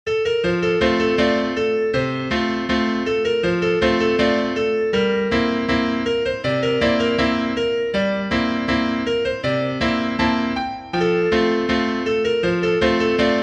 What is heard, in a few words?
piano